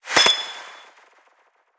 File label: enemy destroyed; War Thunder